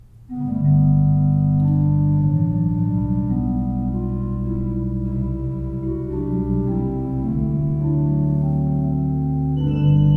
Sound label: pipe organs; Breil 19 II P